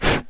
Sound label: game rotation